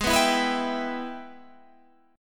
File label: Ab Minor Major 7th Sharp 5th, down, Guitar, Standard; strummed; JGuitar